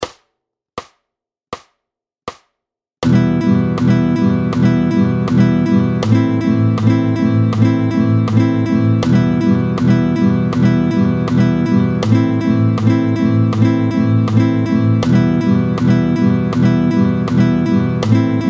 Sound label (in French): Croches tempo 80, Les croches, battement tempo 80